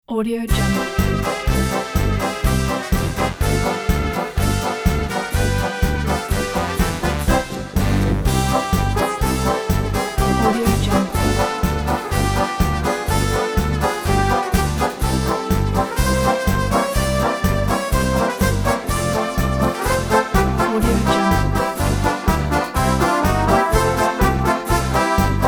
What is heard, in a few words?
oktoberfest polka